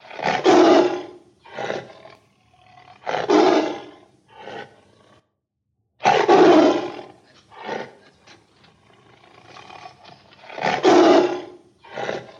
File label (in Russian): zvuk tigra